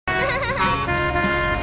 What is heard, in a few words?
cute laugh